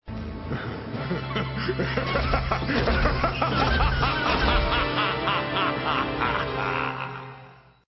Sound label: laugh; Apocalypse